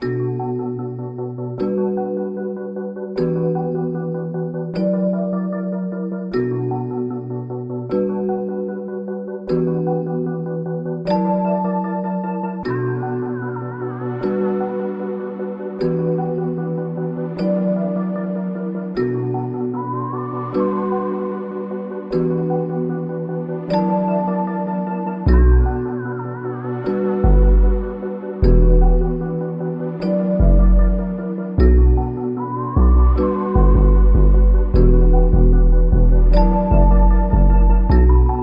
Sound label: 152 melodic loop Bmin; stella